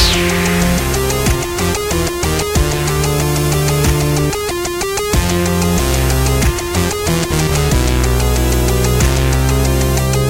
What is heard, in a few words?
8Bit Theme Loop; club penguin island; android